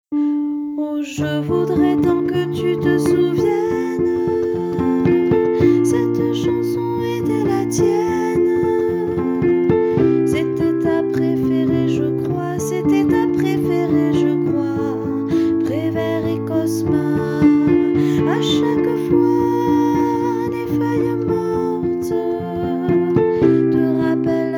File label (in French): alto